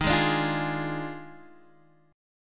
Eb Major 7th Suspended 2nd Suspended 4th, Guitar Open D; down